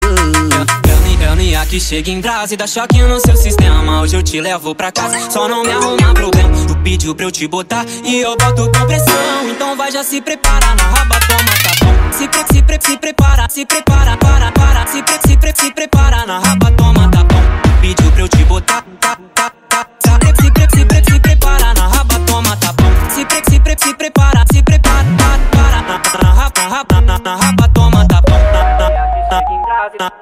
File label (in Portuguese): Brega Funk